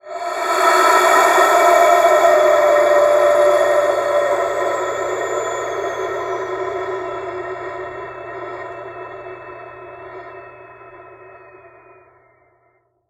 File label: Andean Wind Flute; Sonic Foundry (Sony Creative Software); Continental Drift Aftershocks